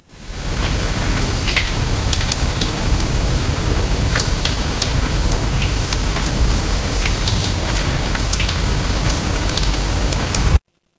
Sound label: ambience rain